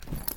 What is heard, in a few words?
lift bag